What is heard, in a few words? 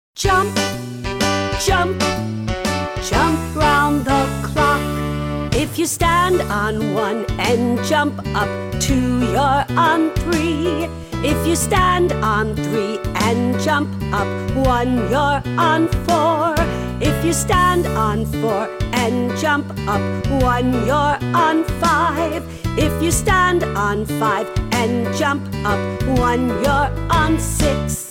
Jump Jump Jump round the Clock